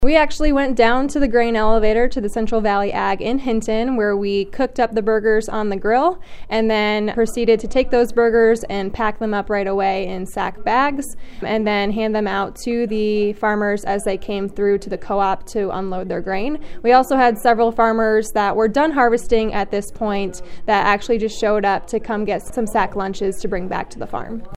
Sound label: Bass